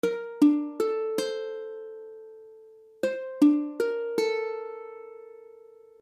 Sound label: Bbmaj9, akordy ukulele